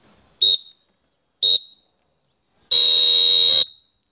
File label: Whistle End